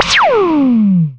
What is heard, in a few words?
Zapps, sci-fi